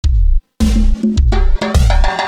samba, drumloops; esoteric; godzilla

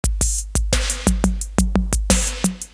808 with reverbed snare; esoteric

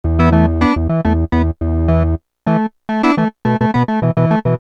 hot electronic organ; esoteric

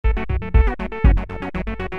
whirly 303 loop; esoteric

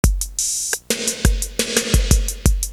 808 mellow groove; esoteric

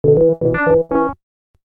oberheim funk sequence, basslines; esoteric

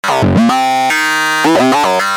crazy plasm, basslines; esoteric

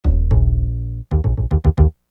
upright acoustic, jazzy, basslines; esoteric

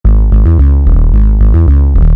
massive synthetic, basslines; esoteric

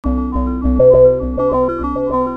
underwater pulses, sequences; esoteric

